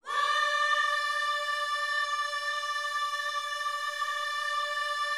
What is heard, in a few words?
WHOA D 5, Vocal Planet Gospel; Spectrasonics; Vocal Planet Vol.1 Gospel; akai